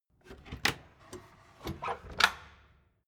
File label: door flap loft open